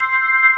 Hi Slow F#2, B3; SoundFont - The Developer's Choice - Volume 1

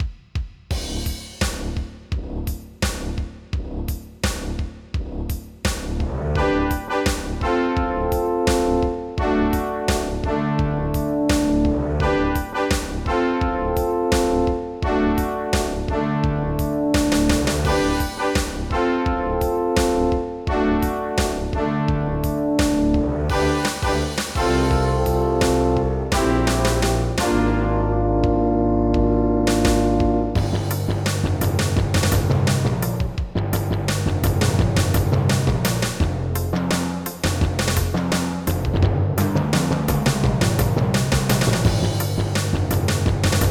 TECHNO

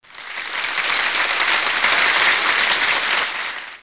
applause short

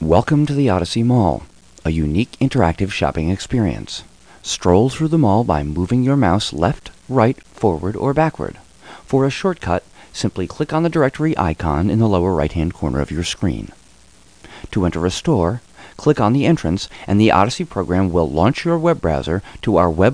om; Odyssey Incentive Vacation Travel Planner

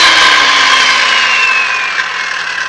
POWER SA, TOOLS

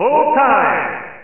full time; SEGASOCR

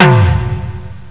ship bump

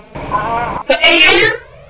strange sneeze; People

